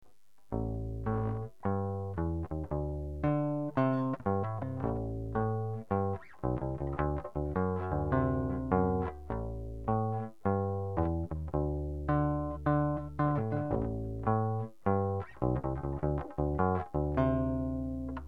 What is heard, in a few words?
harmony H22 bass